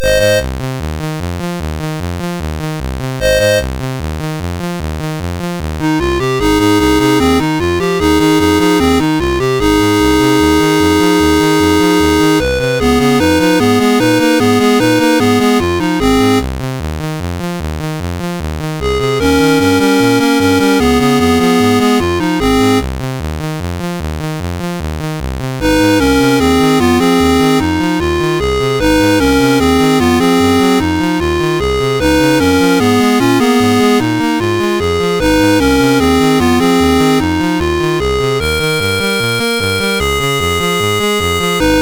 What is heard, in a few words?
MOS 6581 R2